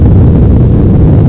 rock fly, weapons

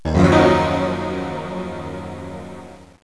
chord; instrumental

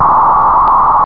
Modem; ProOne: Netcracker Netscape Navigator

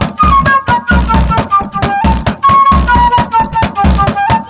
RHYTH, LOOPS

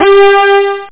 blases; Aminet 21; GTI - Schatztruhe; Amiga 8-bit Sampled Voice; 8,363 sample rate

